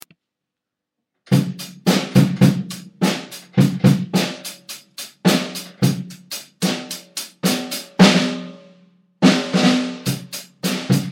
drum solo

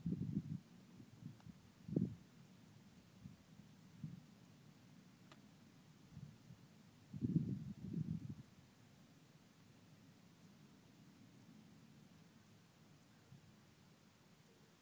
60 seconds of moors ambience

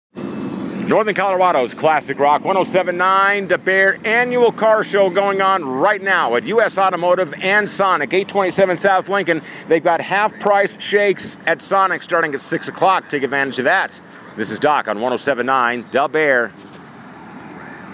car show speed

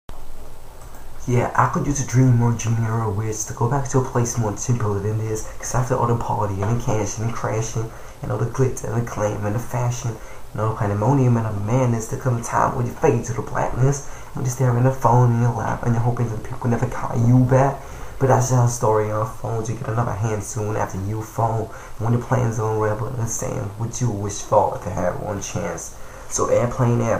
rap cover

